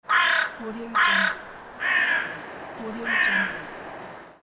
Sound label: crows croak